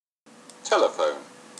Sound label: telephone